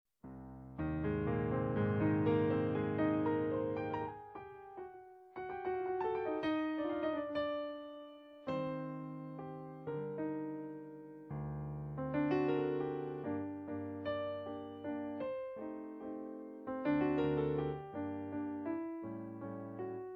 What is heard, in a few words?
Ballade practice